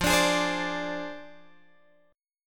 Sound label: F Minor 6th Guitar Open D